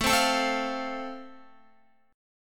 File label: A Minor Sharp 5th, Guitar; 4F,4A,5C,5G,5C,6E; strummed; JGuitar